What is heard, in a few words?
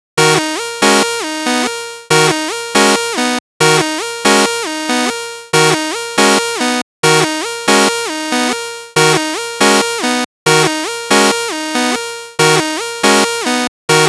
Beat